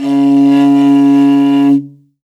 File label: MORIN KHUUR, c#1, BOWED INSTRUMENTS; World Instruments 2; PS MORIN KHUUR